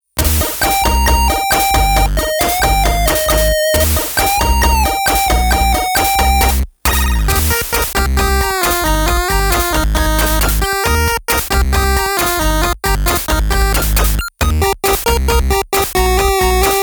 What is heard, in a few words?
8-Bit